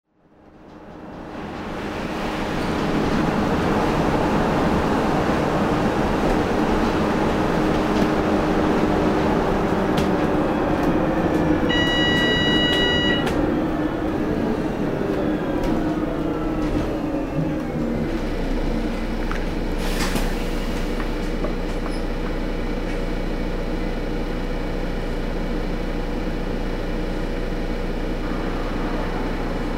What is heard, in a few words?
canning bridge bus, Perth